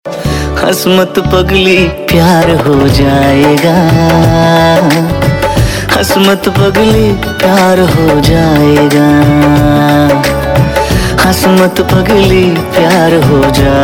Ringtone, Bollywood; Toilet - Ek Prem Katha

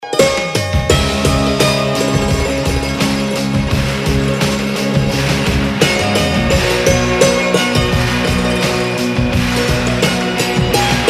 Instrumental

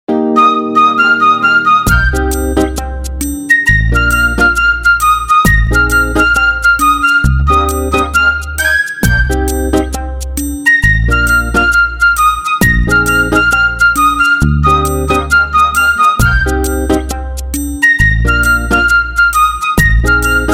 Instrumental Tone; Student Of The Year

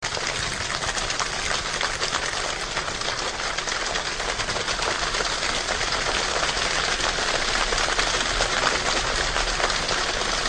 Raindrops

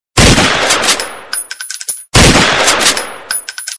Gun Shot Sms

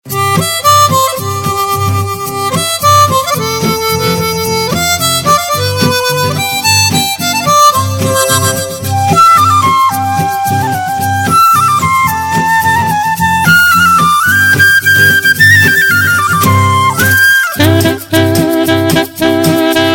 Jingle Bells; Christmas

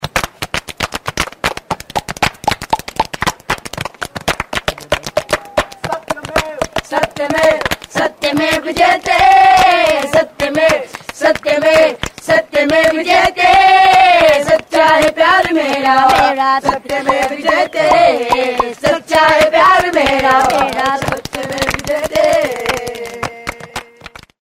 anthem Promo; Satymev Jayate 2014; Clapper Boys

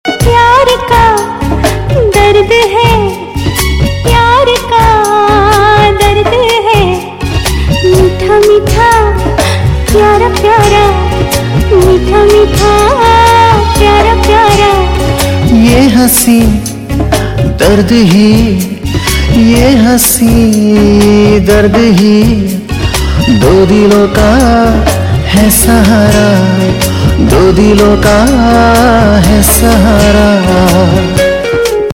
Female

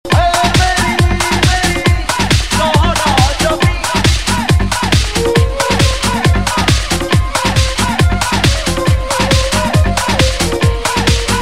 Punjabi Club Mix; Bollywood Dj Mix

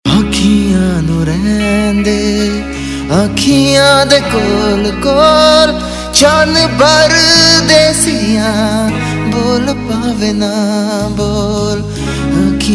Ringtone, Punjabi

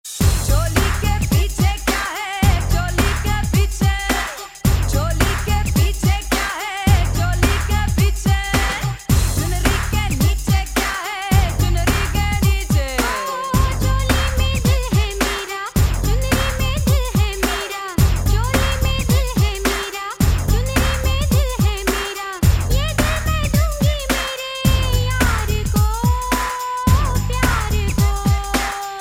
Choli Mix, Ringtones

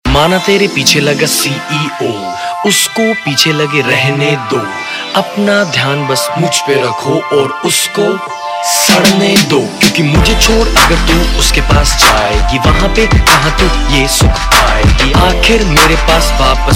RAP Ringtone

